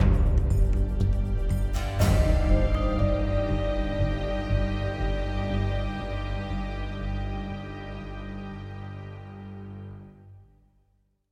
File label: Suspense Endtag